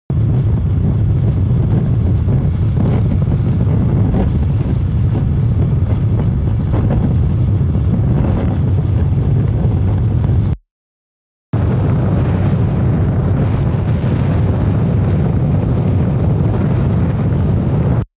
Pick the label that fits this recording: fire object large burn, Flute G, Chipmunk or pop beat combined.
fire object large burn